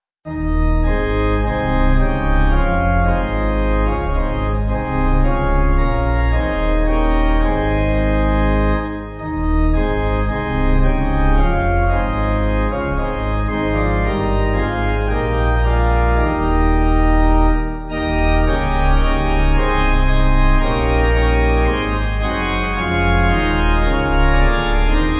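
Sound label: Pipe Organ, Music Backing Files for Churches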